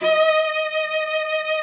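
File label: cello vibrato, D#5; Xsample VOL-7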